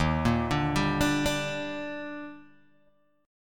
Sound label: Eb Major 7th, Guitar, Open G